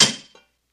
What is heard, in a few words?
Cylinder Klink Hit